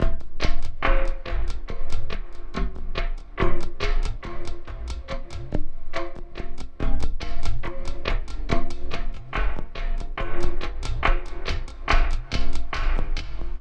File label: Terrestrial Hybrid, Processed Percussion